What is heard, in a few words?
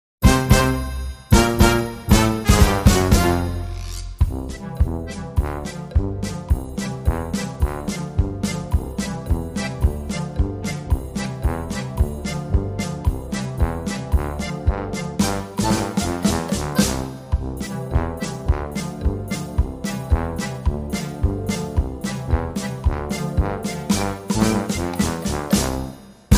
Bierhall Polka Underscore